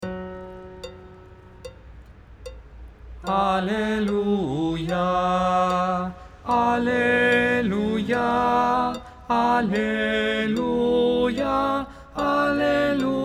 soprano oitavado; Aleluia

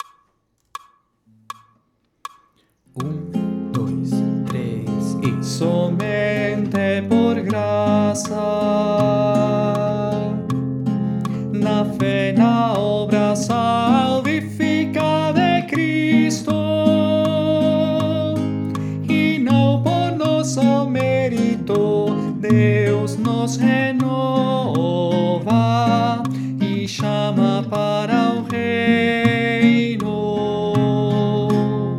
Confessamos juntos Base tenor

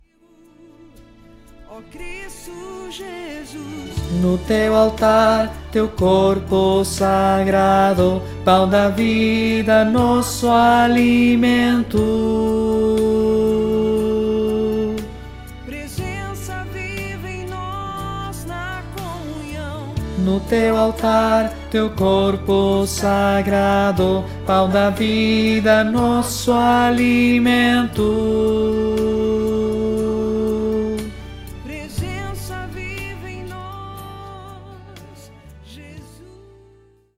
soprano oitavado; comunhão

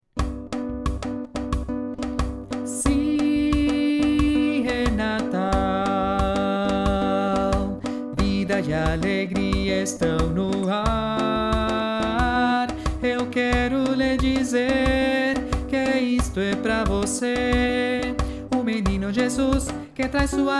Natal brasileiro barítono

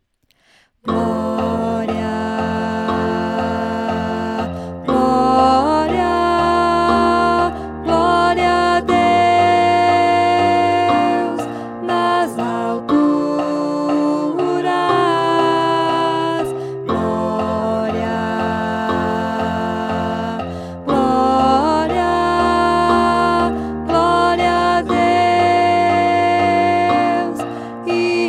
Contra alto

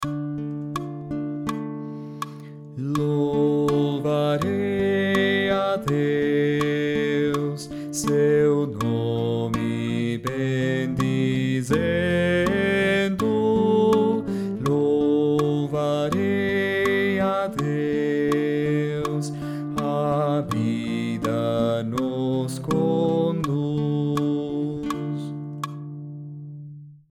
baixo; Louvarei a Deus

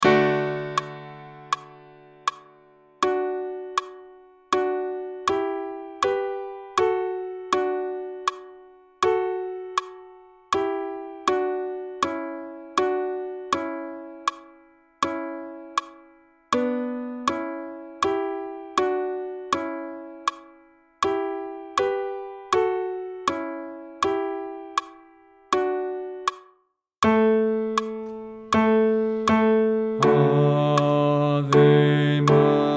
Ave Maria baixo; Engelhardt

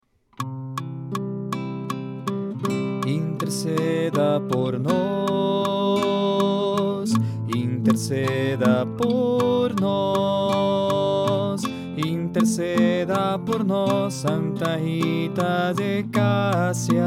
Interceda por nós Barítono

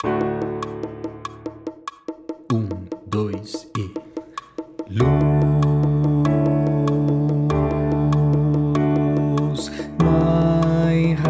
estudo baixo

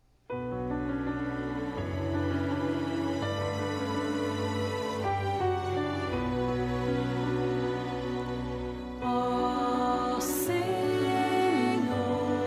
O Senhor Soprano

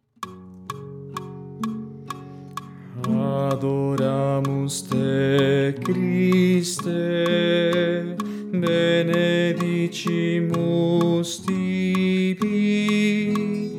Soprano oitavado; Adoramus te Christe

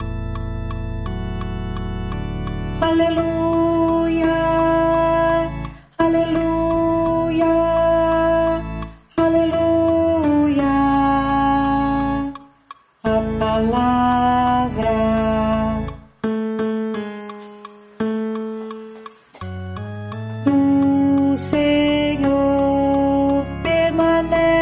guia contralto; Aleluia Cardita; Aleluia Angelo Cardita

